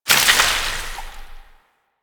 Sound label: Void Hit; Warframe